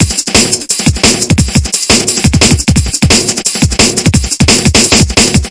thicken higher break, drum loops and breaks, 174 bpm; 161 to 180 bpm